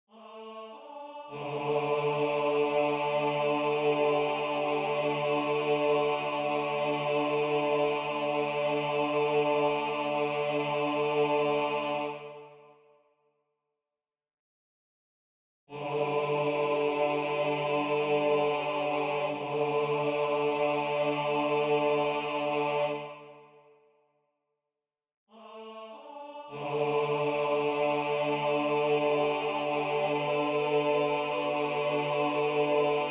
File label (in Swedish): BAS2